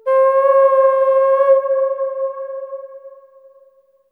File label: AF HRN, AMB HRN FX, Heart of Africa